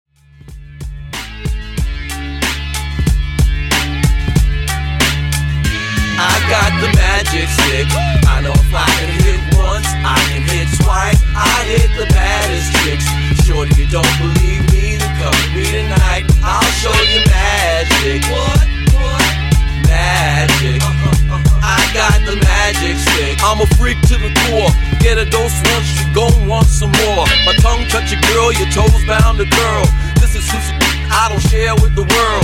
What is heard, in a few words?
Workout Redrum, 93Bpm